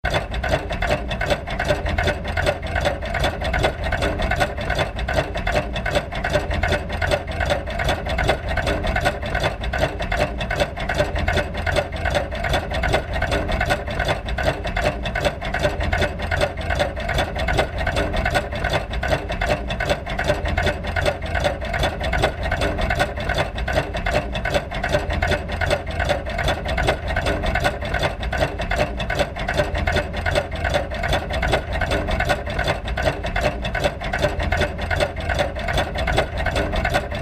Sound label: washing machines; A Composition for 104 Washingmachines (II) Berlin 2000